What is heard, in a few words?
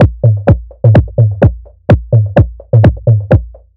Minimal Funk, 127 BPM, Percussion; Sonic Foundry (Sony Creative Software); Underground UK House & Electro